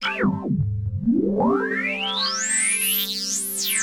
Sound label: SYNTHPAD, HOUSE, 125, A; eJay; Sphere